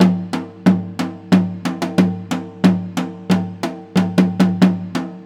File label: janggu; Korea; Instruments